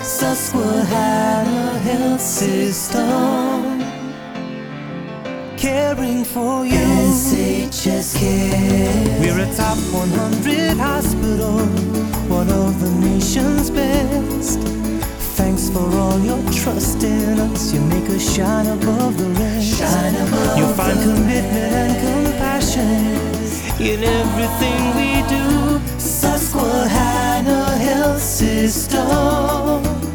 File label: Healthcare Jingles